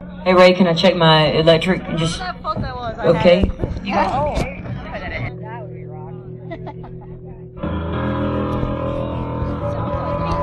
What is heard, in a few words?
electric guitar (soundcheck); charlotte, north carolina